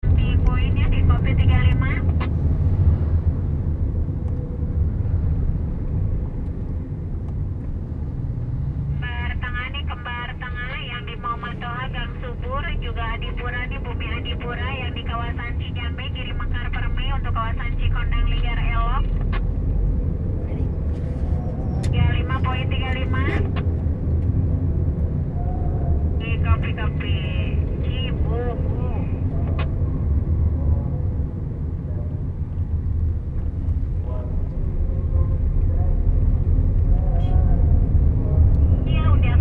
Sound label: taxi radio, Indonesia